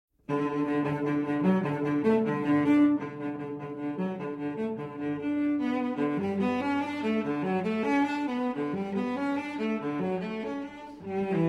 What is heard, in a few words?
Cello Suite in D Major Prélude; Cello Suite No. 6 in D Major, BWV 1012